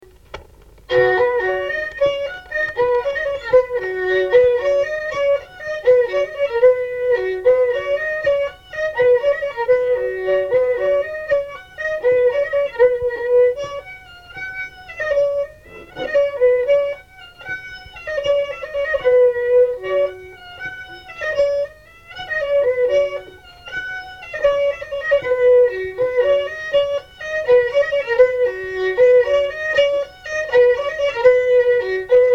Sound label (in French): bourree